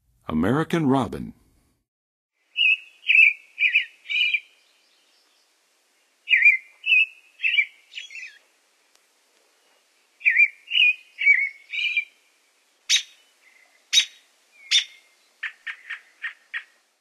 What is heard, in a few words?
American Robin, Western USA Songbirds; North America